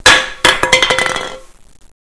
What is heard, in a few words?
hit